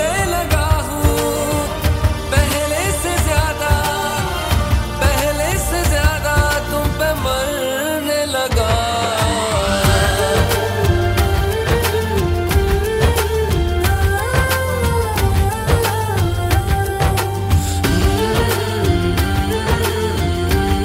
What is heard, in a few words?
ringtone, bollywood ringtones